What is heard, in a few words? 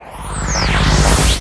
Impact Light; Seer; GamigoNA